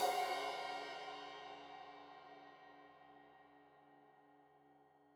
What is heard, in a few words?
Crash 15in L edge, Cymbals Stereo